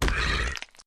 zombie hit

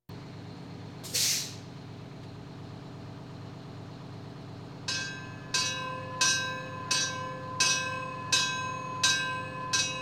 Police, Fire engines

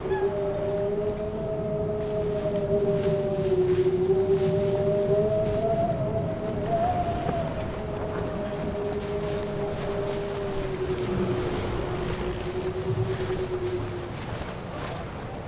Sea Main Deck

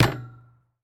Street Ball Glass Back

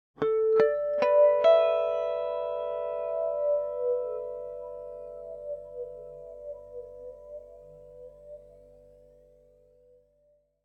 Electric Guitar Harmonic Arpeggio With Flange; Hollywood Edge; sound designer's toolkit v2

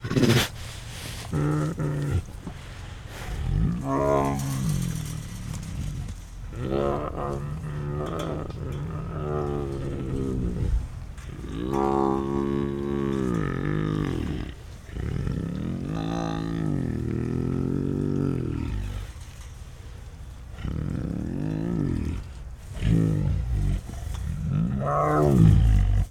Female Bengal Tiger Chuffs and Growls; Tigers; Sound Ideas

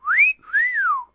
Whistle Human; SFX Kit; Foley - Misc

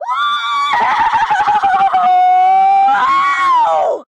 boomboom scream; goon; Blind Drive

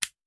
Hand Gun Foley; Sound Ideas; Guns

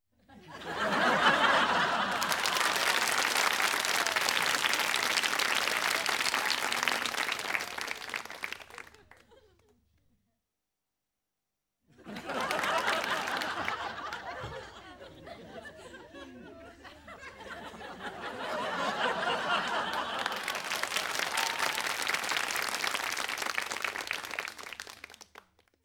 Applause and Laughter; Interiors With People

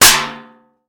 Metal Strike; Crashes; 1001 Sound Effects

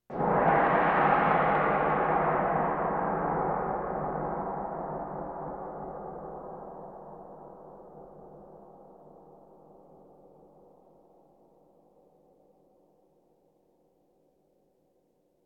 Percussion Bongos Tympani rolls Gongs Drums

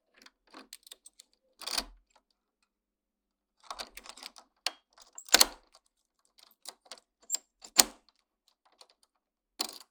door unlock1, household; NS Studios - ultra sound collection

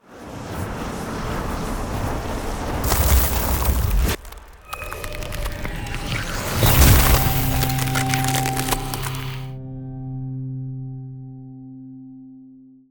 Ice Spell Summoning Summon Ally; Arctic Aura

